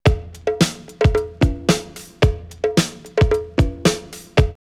loop school 111, drum loops, old school; X-Static Goldmine

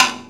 STAKE, PERCUSSIVE; Rarefaction; Poke in The Ear With A Sharp Stick

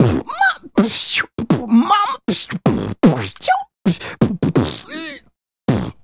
Beat Box, Hip-Hop and RnB Vocals

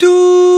VOICE OOHS; Greytsounds; Scott Peers Sound Engineering

